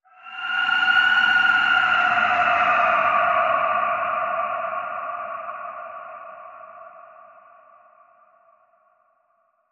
Vengeful Harpy Cry

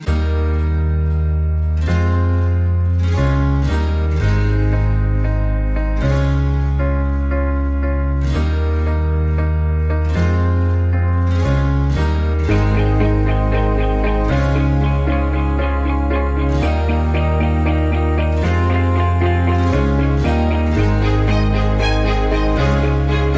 Inspiring Moments, No Drums

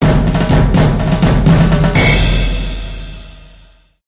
Drum Major, Sting

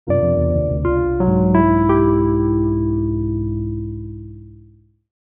Bass Night

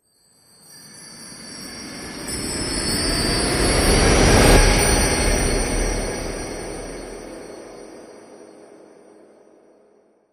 Wishful Rise

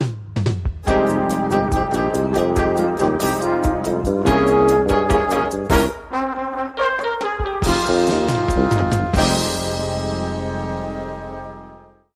Simmering Salsa, Sting

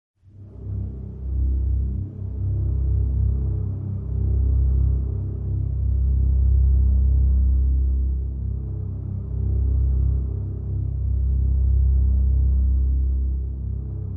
Low Drone

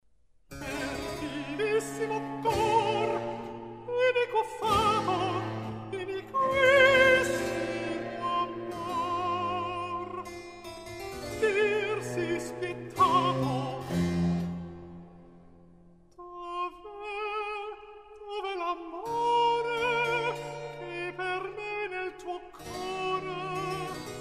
Perfidisimo cor (Cantata for Alto solo); RV674; Antonio Vivaldi

